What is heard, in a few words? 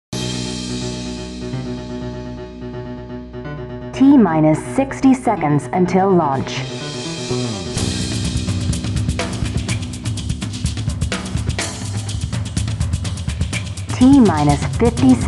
Countdown; just cause gamerip